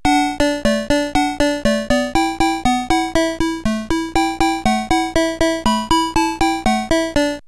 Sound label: Level Start; Frogger